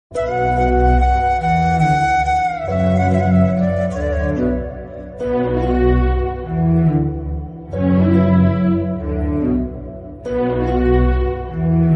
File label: aravinda sametha sad bgm ringtone, telugu bgm ringtone